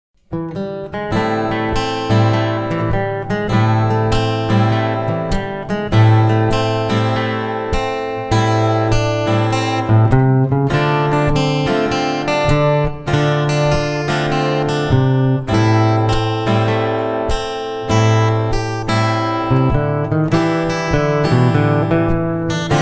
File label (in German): Gitarre Solo; blues; ragtime